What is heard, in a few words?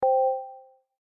Chat